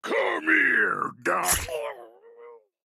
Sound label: goon approuch; Blind Drive